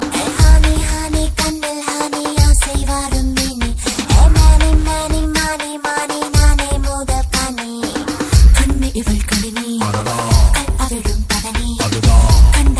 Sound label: Ringtone, Tamil Ringtones; 2009 Tamil Hit Ringtones